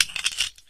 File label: m4a1 silencer off; garrysmod